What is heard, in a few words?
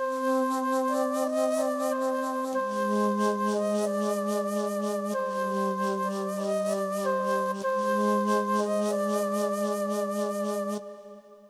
korg i3 flute; Korg i3 Test